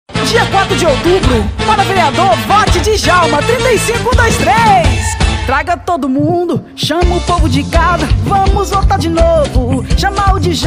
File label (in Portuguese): jingles politicos; vereador